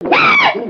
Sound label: Pistol Monkey Yell; gnarled forest